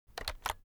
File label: foley office keyboard external shortcut short; smartsoundfx